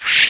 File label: arrow; ABALONE